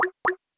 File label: knock door